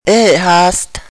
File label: cough